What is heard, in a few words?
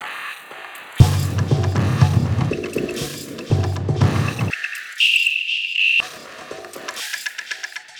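Loop, beats; Sonic Foundry (Sony Creative Software); Esoterik Beatz; Mecan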